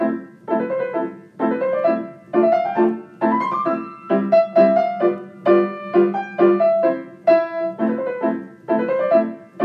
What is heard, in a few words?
Burgmuler arabesque part accent, piano; ブルグミュラー２５の練習曲よりアラベスク; アクセントの例：アクセントのついている音を際立たせて、心持ち鋭く強めに演奏